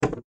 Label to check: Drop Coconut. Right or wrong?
Right